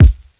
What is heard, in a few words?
treble rich bass drum sound c sharp key; kick